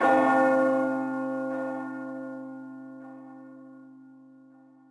big bell; ambient; World of Tanks Blitz

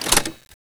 Inf Weapons Foley 3rd; Red Orchestra 41-45

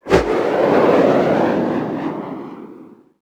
turret aa launch far

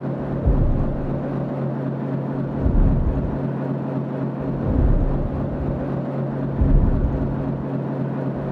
submarines engines; World Of Warships

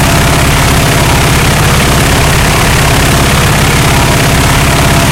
wildcat side, engines; War Thunder

